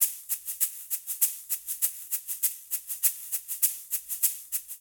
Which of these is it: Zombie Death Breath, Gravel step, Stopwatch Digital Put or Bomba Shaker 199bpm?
Bomba Shaker 199bpm